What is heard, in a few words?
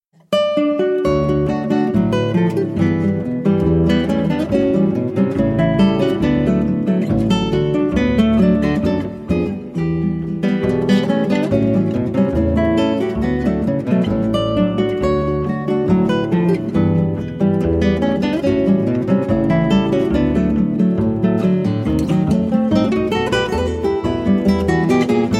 Choro